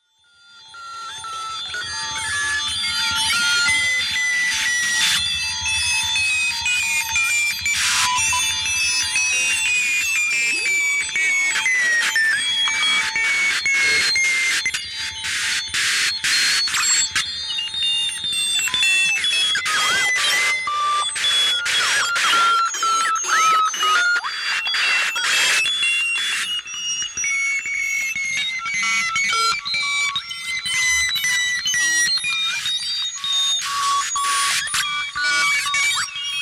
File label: Interference Field Transmission